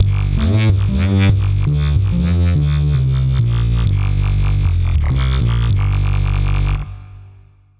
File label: Bass Filthy